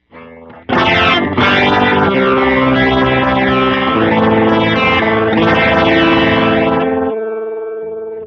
Rotary ROTOR 20.00Hz; Rotary Mod

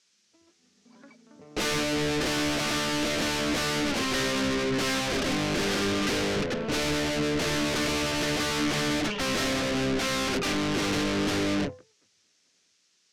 distortion; MTurboAmp; Xanthos

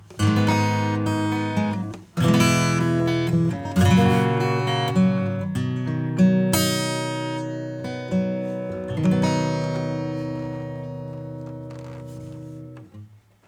acoustic; Harmonics

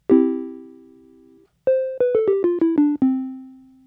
Nord Lead 4 VCA

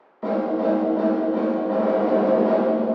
Plate Reverb LOW CUT HIGH CUT

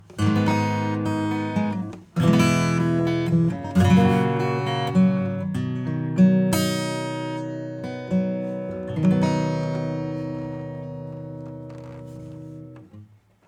acoustic; Analog Pro

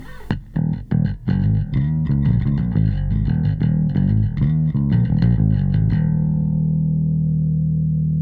bass; Medium

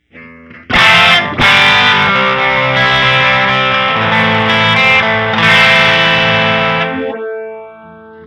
Phaser Envelop; Instant

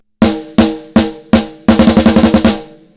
FET compressor low cut

